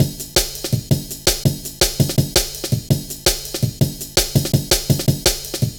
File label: Drum Loops, 165.5; Jungle; Zero-G Jungle Warfare 1, 2 & 3; Scotti; 90 s Sample CDs